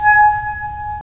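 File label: wind clarinet; LA Composer vol. 3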